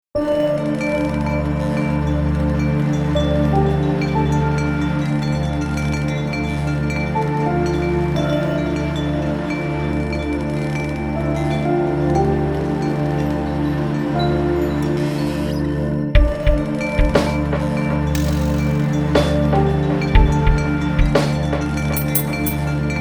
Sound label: IDM Ambient